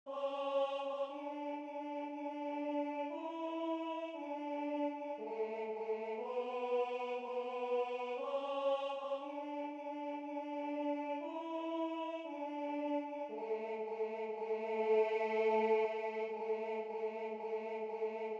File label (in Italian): Tenore